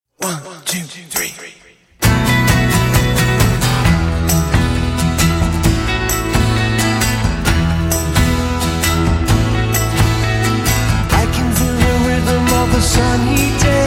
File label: Acoustic Version